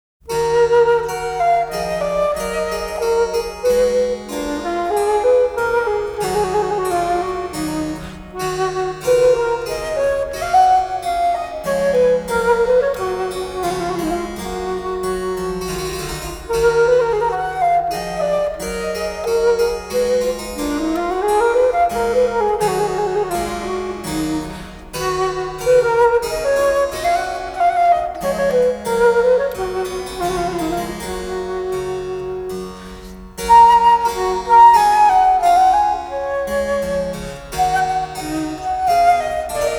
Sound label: Menuet In D Minor; Bach; Bwv Anh.132